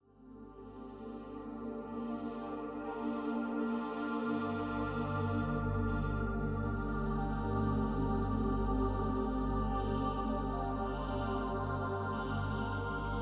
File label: lightwave mono